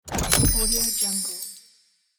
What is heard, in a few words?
open magic treasure chest